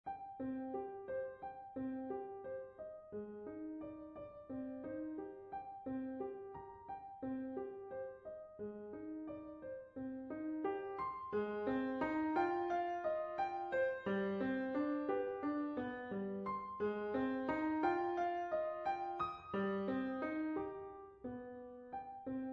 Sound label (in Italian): pianoforte